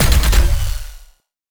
sharpsaw

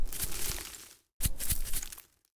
season salt pepper

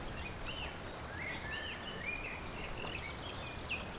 forest bird

